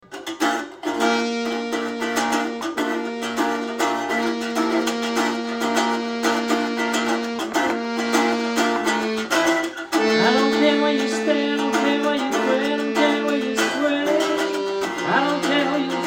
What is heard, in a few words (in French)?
acoustique; acoustic setup